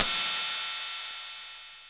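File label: Open Hi-Hat, Hats Samples; Drum Hits; Nu Dance Electro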